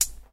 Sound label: damped hi hat sound c key; hat samples